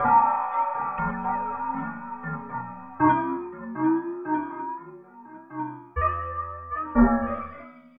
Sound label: Tape Arp; Sonic Foundry (Sony Creative Software); Ma Ja Le Ethereal Textures